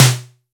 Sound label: snare b key